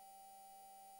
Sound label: mic, mars2020 edlcam